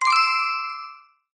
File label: get Coin kira